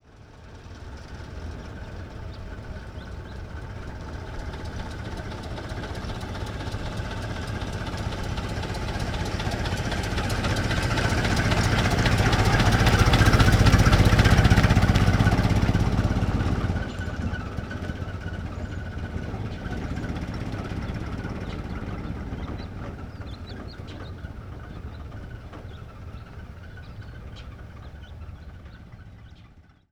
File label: 1924 Ford Stake Bed Truck Ext Slow By; Period Vehicles